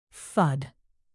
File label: thud